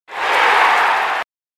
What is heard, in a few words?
cheer